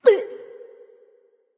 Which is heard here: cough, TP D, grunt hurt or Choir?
grunt hurt